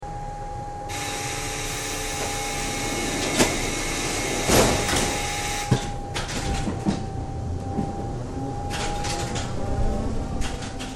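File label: tram door close + go; villamosok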